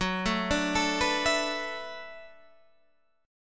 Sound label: D 6th Add 9th F sharp